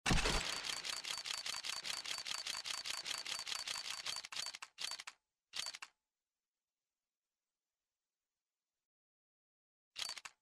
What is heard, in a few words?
roulette